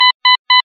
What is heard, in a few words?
3 Beep; lifepak 35